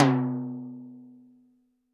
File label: TD-20 Tom A, Roland TD-20 Toms